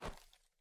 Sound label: step; snakeinabox; double time pitch shift